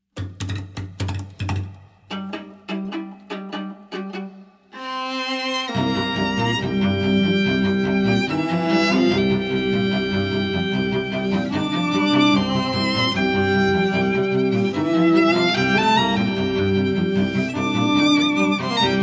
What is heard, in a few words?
Scary Monster String Trio